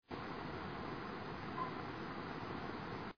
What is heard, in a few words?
bedsprings